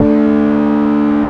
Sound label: Dist Harmonic One Shot AD, Bass; Bass X